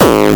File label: Bassdrums Hard Kicks; Vengeance Samples